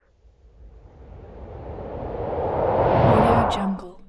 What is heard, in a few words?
whoosh suction